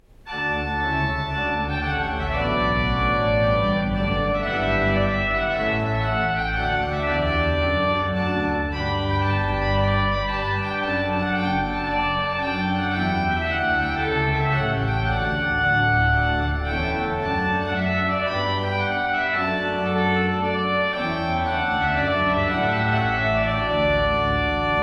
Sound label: Trumpet Voluntary; Stanley